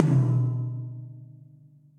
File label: Tom Mid Low Loud Squashed; Drum Hits; Ableton; Factory Packs; Session Drums Club; MPE Hits